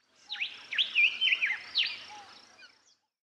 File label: bird; templeofnudges